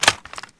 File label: sg550 bipod; weapons